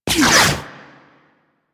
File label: Flak Cannon Alt Fire Pass By; Warframe; UT Flak Cannon